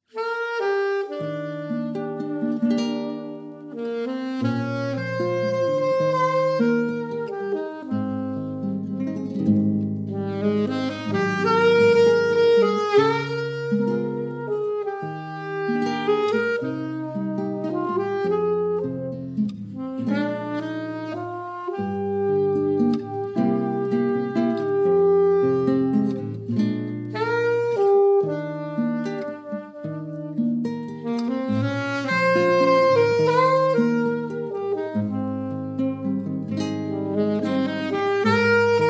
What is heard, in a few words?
jazz duet